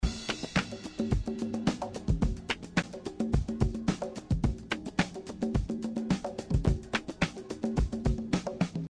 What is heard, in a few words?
Percussion